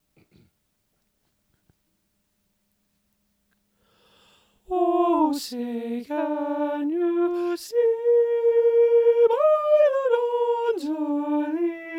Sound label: ovation soprano 1 high, choir